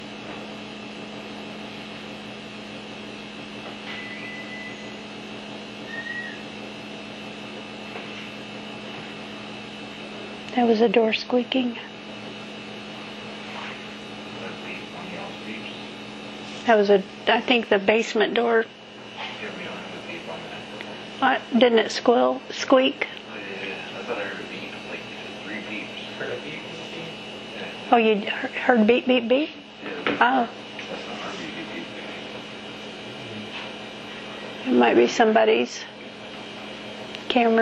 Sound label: beeps door creaks; Listening back on my audio recorder it picked up both the squeal and 3 beeps; Springfield Carriage Co